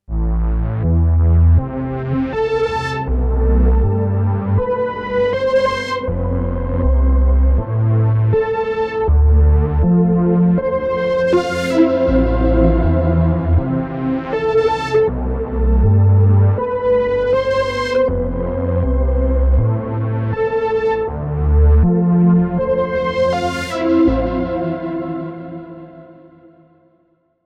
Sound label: UNO Synth Pro X Presets